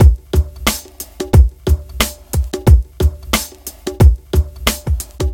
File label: Drum Loop After; Slam Pro